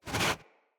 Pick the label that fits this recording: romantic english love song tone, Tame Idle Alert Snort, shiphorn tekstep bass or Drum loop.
Tame Idle Alert Snort